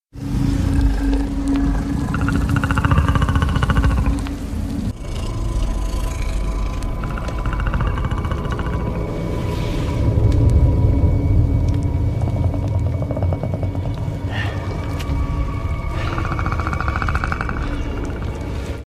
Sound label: predator growl